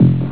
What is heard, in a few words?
pulse indicator